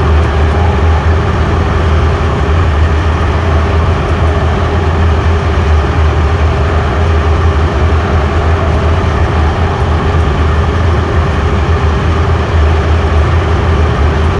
Work Room Shaft Amb Loop; moul

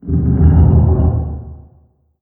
Random Rock

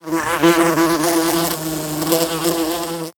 Random Fly

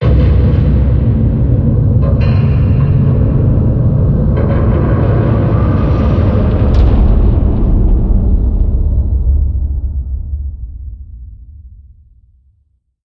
Hut Shutter Close; moul

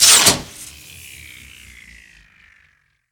Power Switch Off

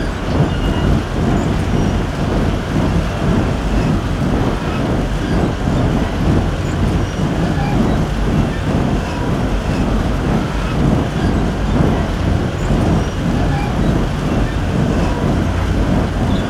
Fan On Loop

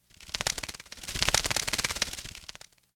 Bird Fly By

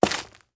Stone Walk

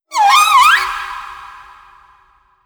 Critter Female Verb; moul-assets; Kahlo

